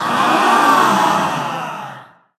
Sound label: Ahhhhh; Running Man Jungle; genki